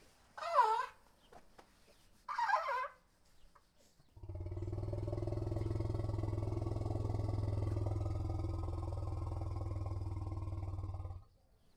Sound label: Asian elephant natural